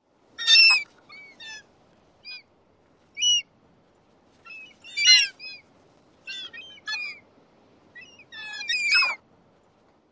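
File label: squeals, Western Gull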